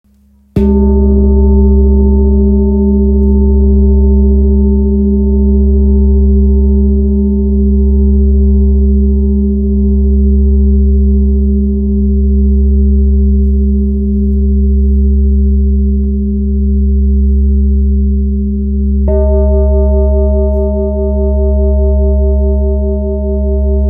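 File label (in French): Bol grave fleur de vie do diese, bols chantants tibétains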